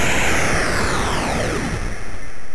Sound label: dock